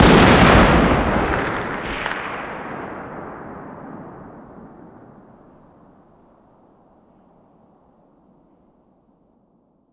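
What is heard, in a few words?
fire distant 338lm rifle exterior; gmod; arccw uc commons attachments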